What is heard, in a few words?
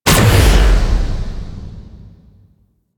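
Sundering Dissipation Projectile Explosion; Warframe; Focus; Unairu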